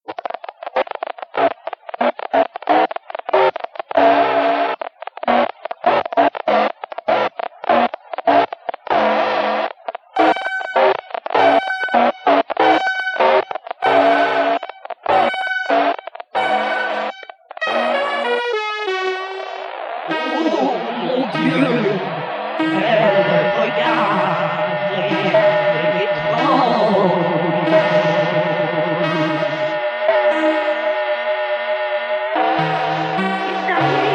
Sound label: Jingle